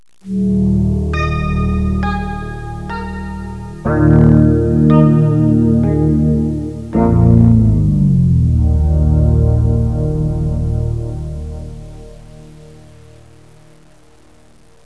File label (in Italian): musica newage